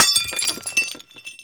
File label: small glass, bullets impact; hl2